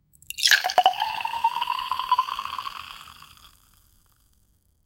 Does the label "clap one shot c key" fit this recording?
No